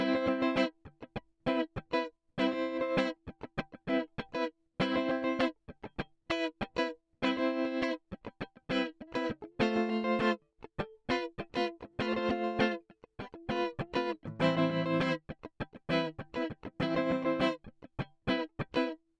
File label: Guitar Rythm Tremolo Depth50 Freq6 sinus